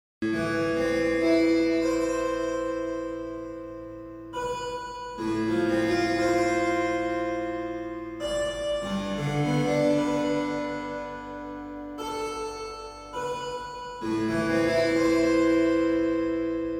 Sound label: harpsichord